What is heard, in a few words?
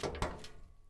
turn metal; Escape from Tarkov